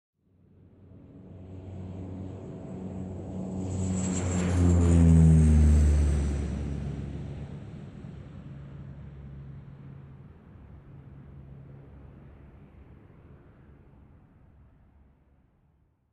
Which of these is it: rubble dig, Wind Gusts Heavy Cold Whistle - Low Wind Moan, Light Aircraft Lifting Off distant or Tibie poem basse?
Light Aircraft Lifting Off distant